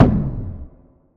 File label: Impact Boom Thud; bottlerocket